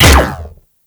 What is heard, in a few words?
Corpus UMP Shot